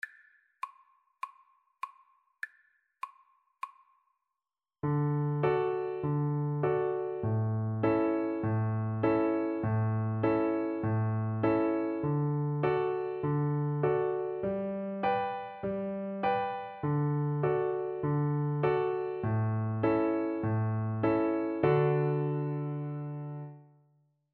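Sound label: viola karaoke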